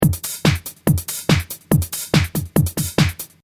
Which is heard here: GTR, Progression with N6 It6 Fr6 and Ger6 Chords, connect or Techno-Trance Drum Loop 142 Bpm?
Techno-Trance Drum Loop 142 Bpm